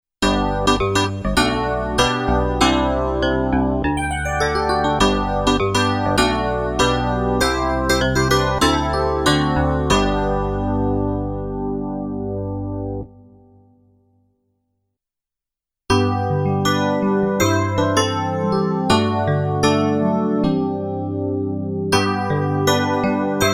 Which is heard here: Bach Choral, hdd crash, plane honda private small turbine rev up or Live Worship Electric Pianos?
Live Worship Electric Pianos